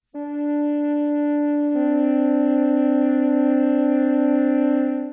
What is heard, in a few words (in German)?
flöte d2 c2; Intervalle, die man durch Potenzen von 2 und 3 darstellen kann